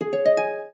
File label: Open, phonetones, LG A200 system